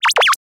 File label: cutely landing, anime